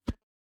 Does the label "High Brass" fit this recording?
No